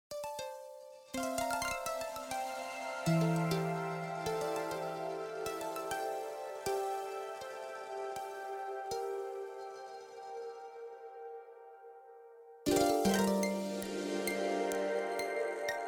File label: Harp